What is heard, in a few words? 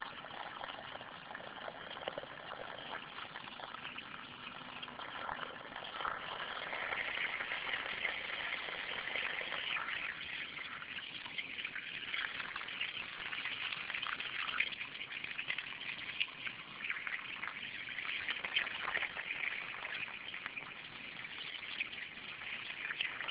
hose water